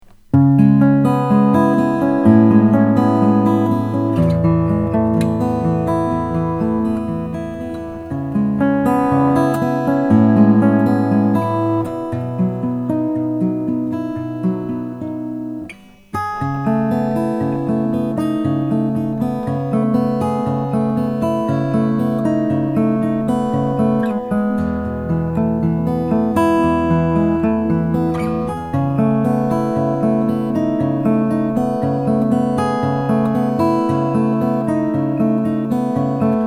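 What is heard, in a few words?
osthoff om the tree mahogany, guitars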